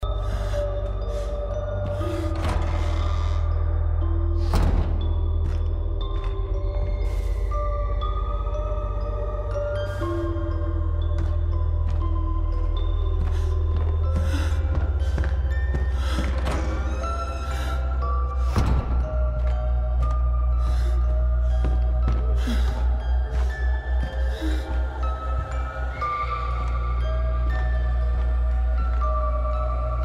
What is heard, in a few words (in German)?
musik